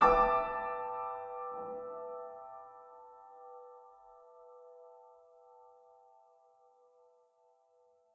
Piano

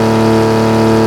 Audi Rs6 High; car simulator 2

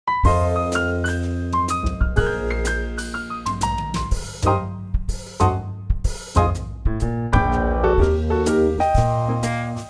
serving music intro

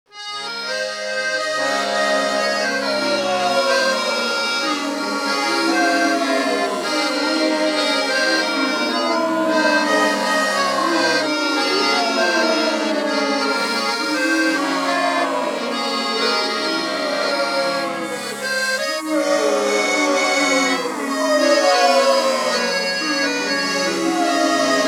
Harmonica; Worms Pinball

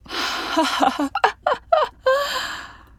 Female Evil Laugh

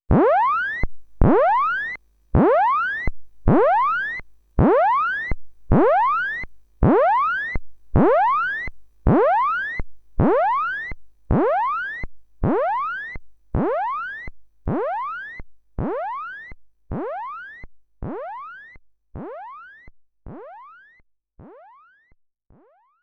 DISK ERROR; Sega System24 Sound Collection

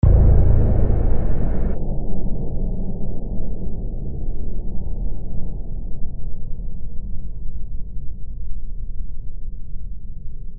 Hit; cry of fear

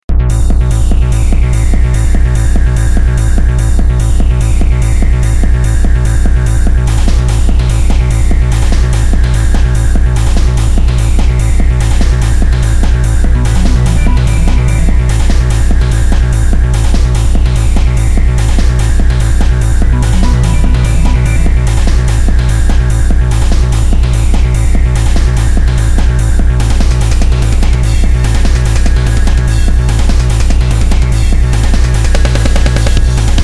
Techno; BlazBlue Chronophantasma Extend

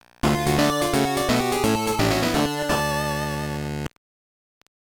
Jingle; booby boys; gb

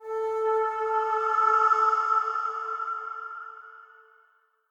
MUSIC BRASS; adams venture origins

